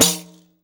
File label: TAMBOURI.HIT; Best Service; World Colours